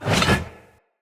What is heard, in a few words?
Hammer Whoosh; Weapons; Enemy; Blunt; Hammer And Shield Weapon